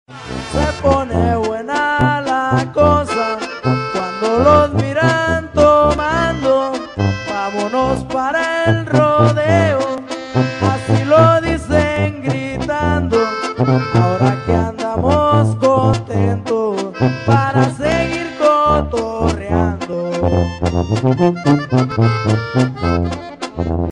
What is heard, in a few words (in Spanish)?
corrido ringtone